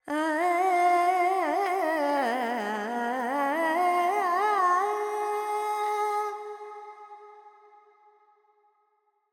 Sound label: FX Dorian, Vocals, Carnatic Female, Alaps, 70 G